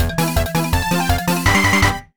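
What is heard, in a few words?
Jingle Game Start; minna no oekakiya san 2009 nds gamerip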